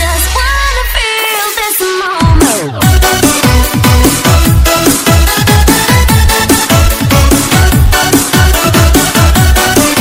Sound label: Cello